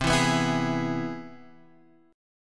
C Augmented Major 7th C down Guitar Drop D

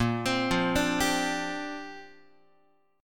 A sharp 6th Add 9th, Guitar; A#6add9 arpeggiated; JGuitar

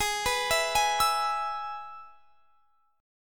G sharp Minor Sharp 5th; arpeggiated; x,23,21,21,21,24; G#m#5

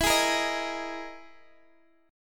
E Major 7th Flat 5th, Guitar, Open D

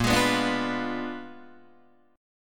Bb 7th Flat 9th Bb down Guitar Open D

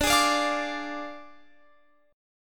D Diminished Guitar Open F, chord; Ddim strummed

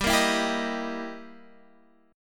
G Minor 6th Flat 13th G

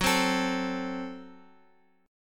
Gb Diminished, down, Guitar, Open F; strummed; JGuitar

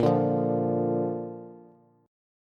Bb Major Bb down Bass; strummed; JGuitar

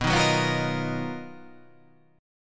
B Major Sharp 11th

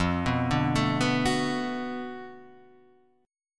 B Minor 7th F, chord; Bm7/F; arpeggiated; JGuitar

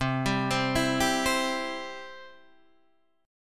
C Major, C, down, Guitar, Half Step Down

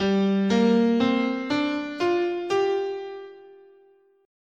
G Minor Pentatonic, scale

